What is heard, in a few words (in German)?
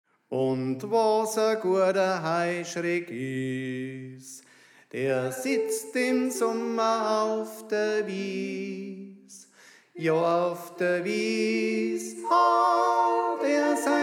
Tenor; Und Was A Guata Heuschreck Is